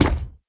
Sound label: Shield steps